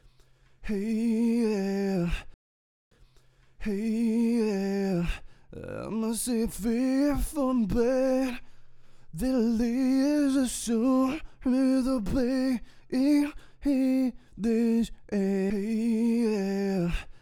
main vocals

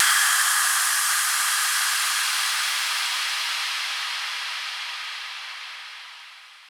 Exhaust Breath

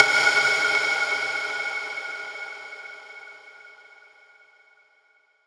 Impact, foley

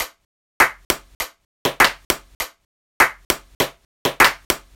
Percussion Loop (100bpm)

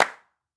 Acoustic Clap

